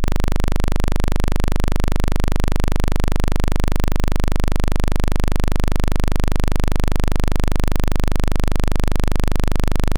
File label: tone